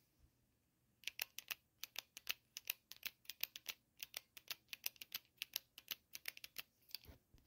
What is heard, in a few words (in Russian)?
zvuk shelchka ruchki